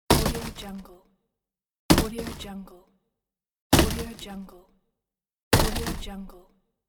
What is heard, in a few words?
cardboard boxes